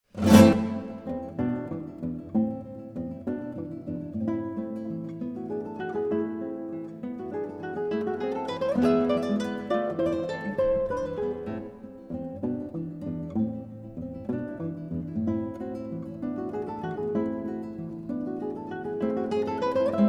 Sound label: guitar duo